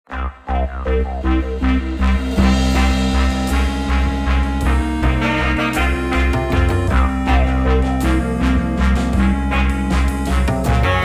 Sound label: The Tension Music